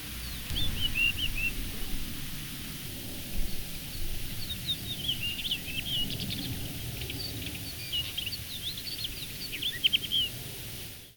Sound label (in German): steinroetel